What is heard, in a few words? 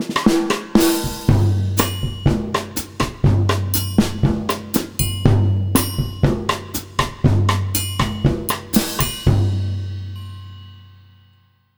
120, Brazil Bossa; USB Soundscan; AKAI